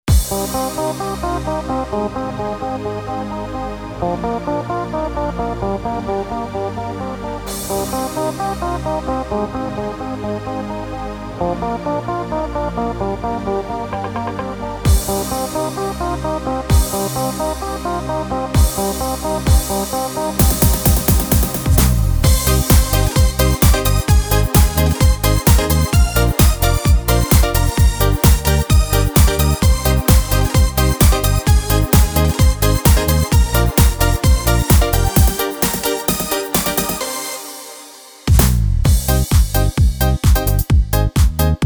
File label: Disco